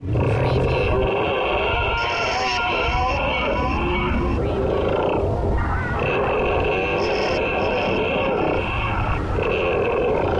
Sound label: ambience of pain